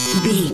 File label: retro fail sound